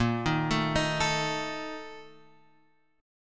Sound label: A sharp Major 7th Suspended 4th, down, Guitar, Open F; arpeggiated; JGuitar